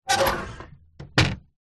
window old wooden close hinge scrape queenslander australia; household; zapsplat